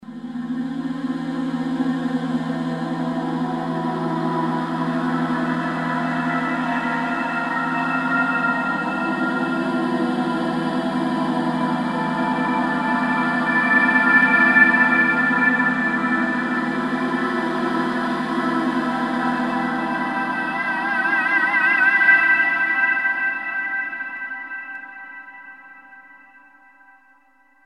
choir, roland jv2080; Choir: scary

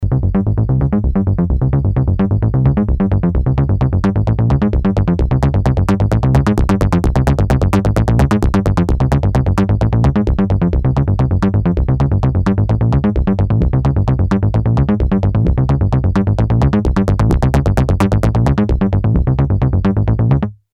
yamaha tx81z

